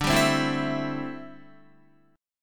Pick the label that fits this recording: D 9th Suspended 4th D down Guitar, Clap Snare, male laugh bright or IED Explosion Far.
D 9th Suspended 4th D down Guitar